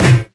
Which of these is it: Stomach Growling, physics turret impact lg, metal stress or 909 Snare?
physics turret impact lg